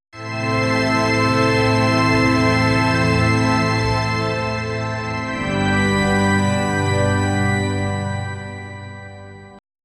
general midi, organ; flex plugin

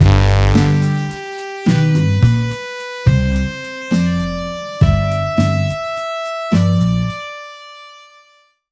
early 80s tragic heroism; badass

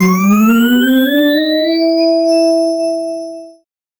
empowering progression so, empowering

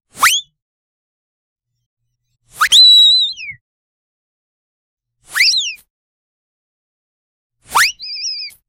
a classic cartoon slip; sound effect

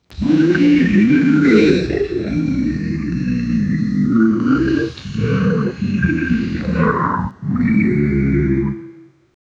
science fi dinosaur fight

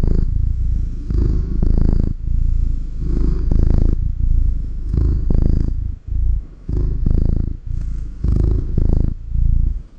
warm gentle cat purring

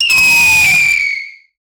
high pitched firew; 2s; sfx; human voice

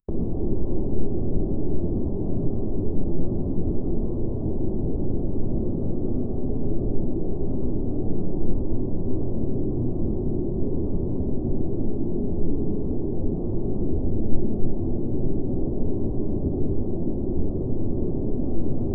soft fog sound for type, ambient noise

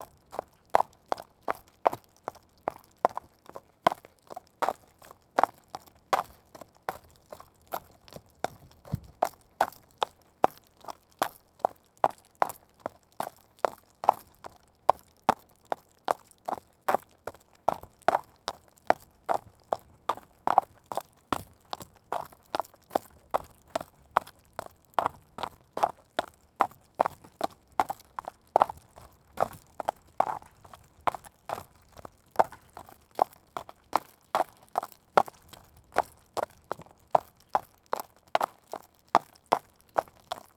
running on stone

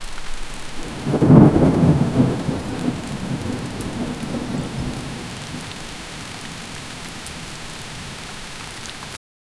heavy raindrops on leaves

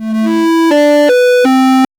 descending 8 bit sad melo

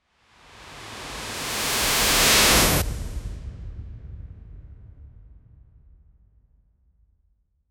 cinematic whoosh

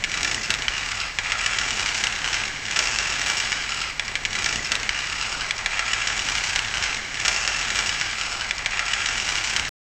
a looped continuous windo, household

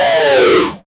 player receives damage

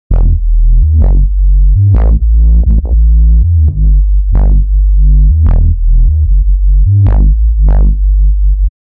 trance club heavy bass

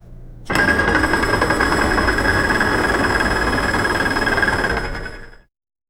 food trolley rolling

